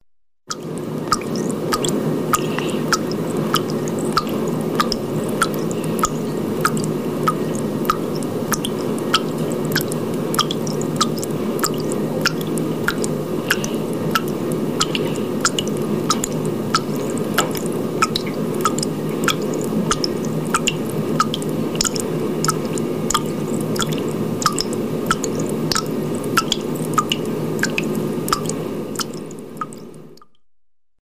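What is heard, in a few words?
drop water dripping from a water tap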